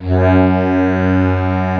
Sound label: tuba loopnote